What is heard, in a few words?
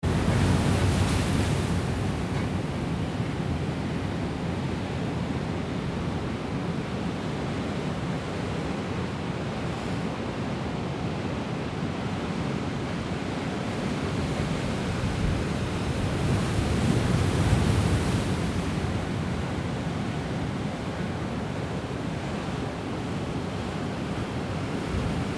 Strong Waves on the Ocean in Casablanca Morocco